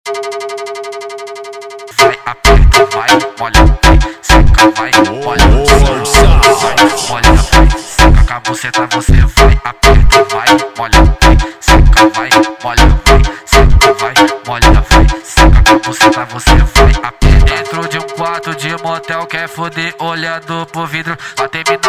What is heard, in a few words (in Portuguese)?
eletro funk pancadao